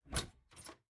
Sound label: Door Open; miside demo windows gamerip 2023